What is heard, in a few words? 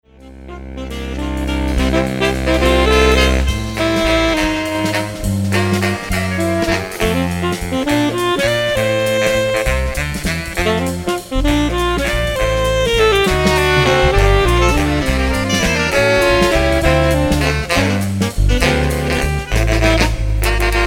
jazz valtz live